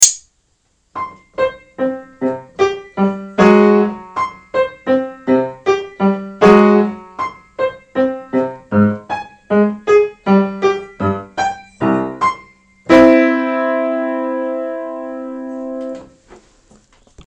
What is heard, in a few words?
circus tricks, Piano